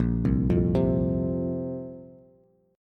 A sharp Suspended 2nd A sharp down Bass

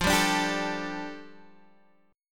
A 7th Suspended 4th Sharp 5th, F

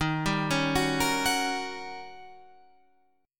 D sharp 7th Sharp 9th Guitar Open F; arpeggiated; JGuitar

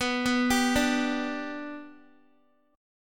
B-Minor Sharp 5th, Guitar; arpeggiated

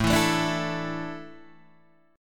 A Suspended 4th, Guitar Half Step Down

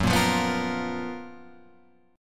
Gb Major 11th down Guitar Open F; GbM11 strummed; JGuitar

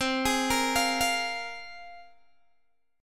C 7th Suspended 4th Sharp 5th, Guitar, Open G

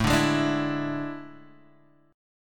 A Major 7th Suspended 4th A

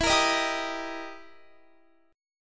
E Minor Major 7th Flat 5th E down Guitar; strummed; EmM7b5; 4E,4A,5D,5G,5C,6F

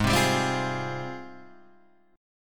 Ab Major 13th, Guitar, Open G; 6,5,5,5,6,6

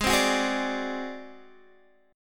Ab 7th Flat 5th Guitar Standard, chord; Ab7b5 strummed; JGuitar

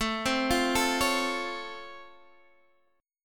C sharp Augmented Major 7th A, chord

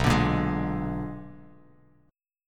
B-Minor Major 13th, Bass Standard; BmM13; strummed; JGuitar

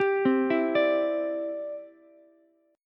C Major Add 9th, Ukulele; arpeggiated; JGuitar

